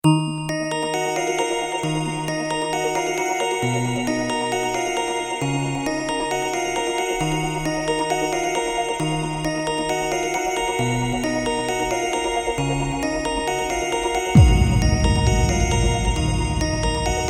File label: Ringtone